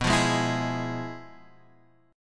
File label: F Minor Major 7th Flat 5th, B